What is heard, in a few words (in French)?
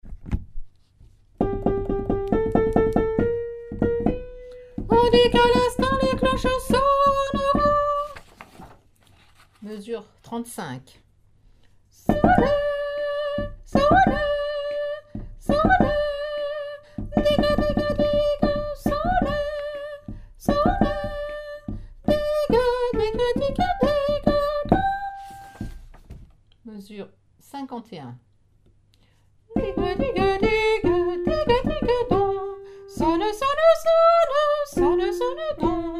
tenor, chorale